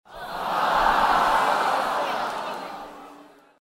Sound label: crowd sad